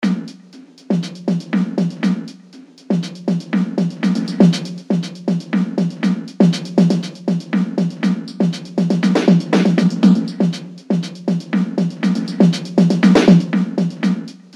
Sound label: percussion